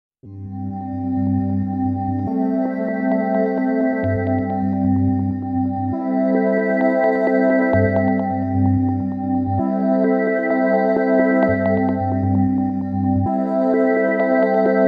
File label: Korg KROSS 2 Synth Combis